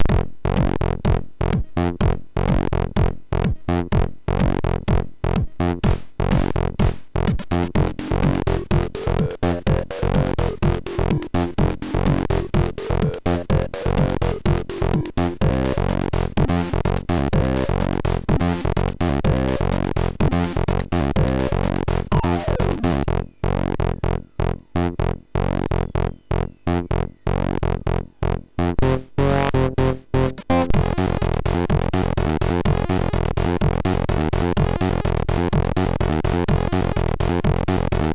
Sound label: Brutal Techno, sid MOS6581R3; hvsc